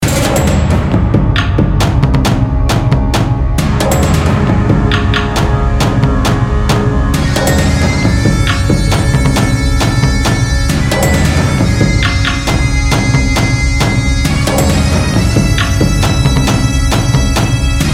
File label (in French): Musique Epique